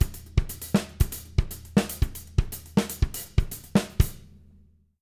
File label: 120, Living Drums, CARIBE; Sampleheads; Peter Erskine Living Drums